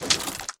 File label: armor